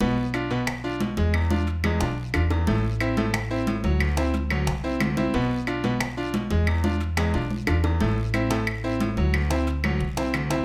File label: Salsa 90 Bpm